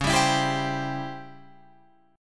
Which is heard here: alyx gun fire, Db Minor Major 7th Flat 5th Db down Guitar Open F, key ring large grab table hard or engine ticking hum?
Db Minor Major 7th Flat 5th Db down Guitar Open F